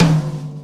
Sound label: tom; drums; virtual drum sounds